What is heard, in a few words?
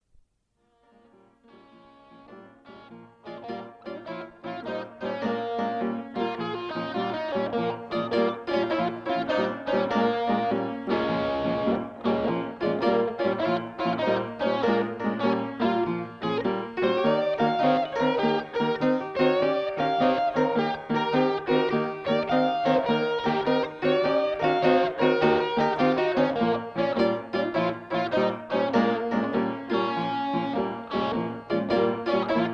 Blues